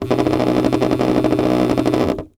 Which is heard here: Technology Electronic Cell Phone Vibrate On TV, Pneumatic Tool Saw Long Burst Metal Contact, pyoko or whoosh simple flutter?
Technology Electronic Cell Phone Vibrate On TV